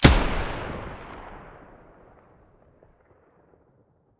mdr muzzle mono, weapons

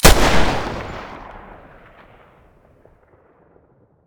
muzzle deagle stereo

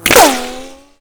ricochet; STALKER; IWP

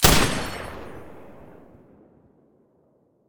silencer M249 stereo

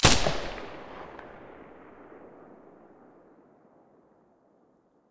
silencer sa80 stereo

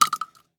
Shell 12ga gravel; STALKER; IWP

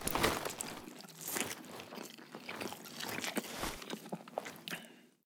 meat use; STALKER; IWP; item usage

